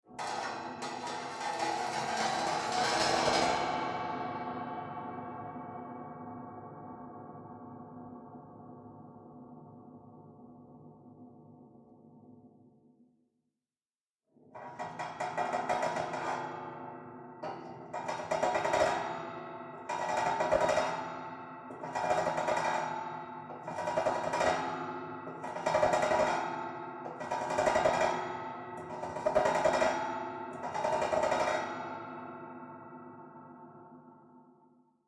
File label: Metal Carbon Steel Cheesegrater Scraping Reveb Contact Mic; Guild Wars 2 (Original Foley Soundtrack)